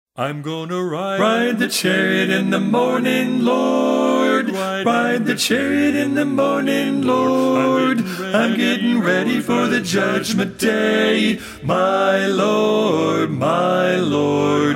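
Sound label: Baritone; Ride the Chariot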